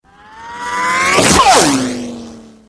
Reverse Ricochet

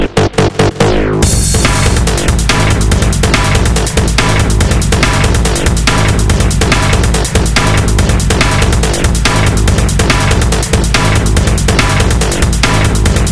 Dj Darkstep

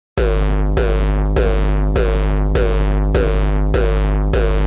Electric Effect

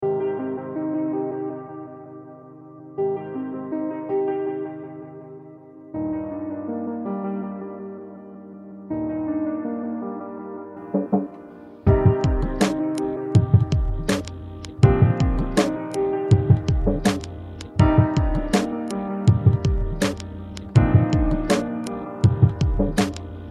Wake Up Tone

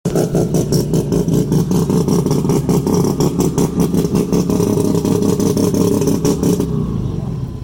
Rotary

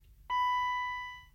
BMW E34 bell

